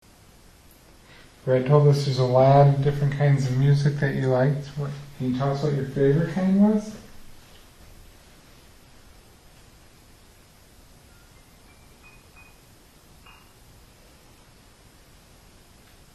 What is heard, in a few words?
rolling hills musical response